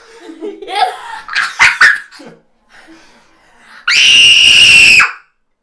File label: Kid Scream; Audioversum Innsbruck